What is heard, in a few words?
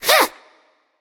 grunt attack; discordia